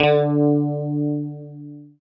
GUI, E-Guitar

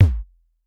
kick, Drum Machines, Clavia Nord-Drum; 300 Drum Machines

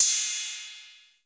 cymbal, Roland MC-909

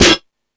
Crash, Drum Machines E-MU Pro-Cussion

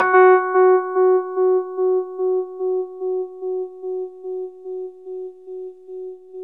Chor Rhodes, F#; E-MU Producer Series Vol. 5 – 3-D Audio Collection; 3DRhodes; 3DChorRhodes1.5

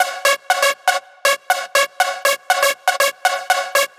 Trance Synth

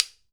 CLAP, KOREAN DRUMS; AKAI S6000 CD-ROM - WorldSounds

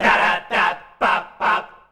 "darabab" F, MALES; Best Service; Hyper Dance

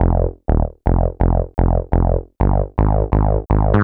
BSE 125 F, BASS LOOPS, House; Best Service ProSamples vol.10 - House; AKAI

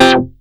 SHORT CHOR; Masterbits; Vintage Voltage

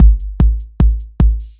4 4 w Hats, Drums Beats

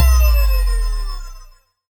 D+B

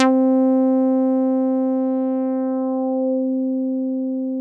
JV TB303 ACID; JV-1080; Syntec; Wall of Sounds; VINTAGE-EXP

